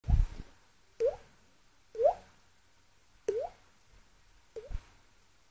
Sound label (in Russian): Звук падающей капли